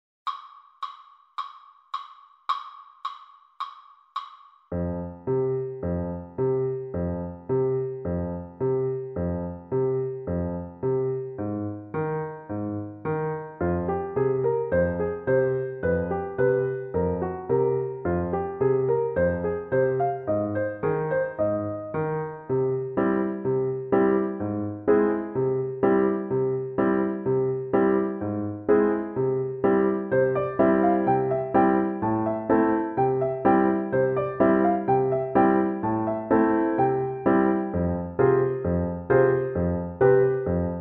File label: piano only; In the Hall of the Mountain King